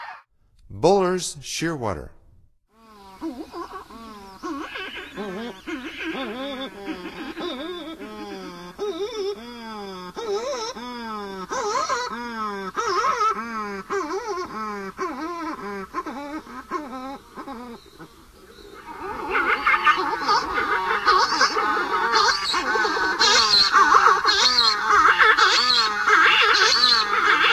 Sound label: Bullers Shearwater; North America; Stokes